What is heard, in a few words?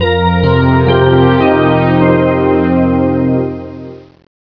Arpège